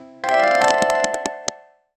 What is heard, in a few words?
open pop up window casino